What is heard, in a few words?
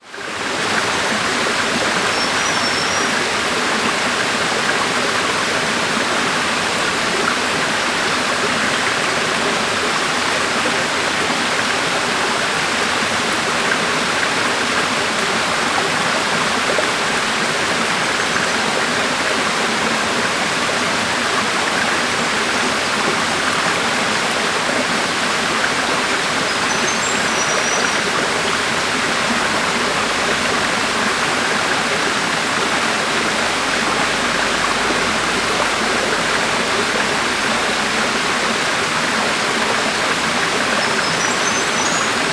nature waterfall 10 metre distance kondalilla australia